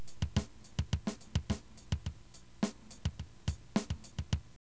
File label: Drum